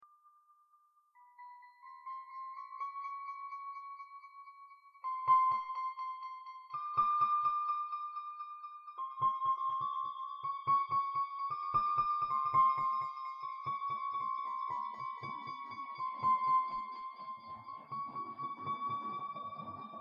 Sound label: electroacoustic pieces